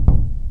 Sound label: drywall footstep, plaster; physics